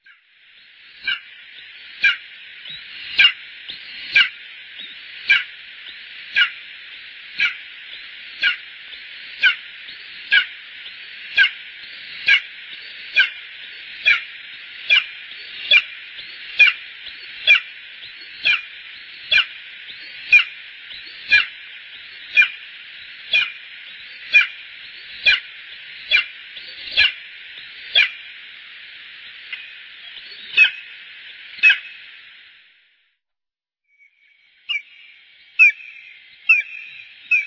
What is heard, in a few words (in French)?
aigle de bonelli